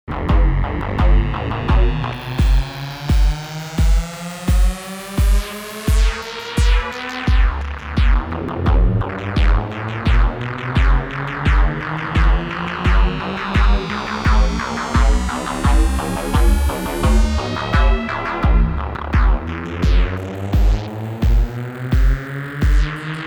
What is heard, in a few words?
Filter Jung LPF BPF HPF; electribe